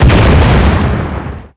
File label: Small Exp, Explosions